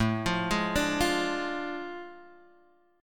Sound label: A Major 7th Suspended 4th Sharp 5th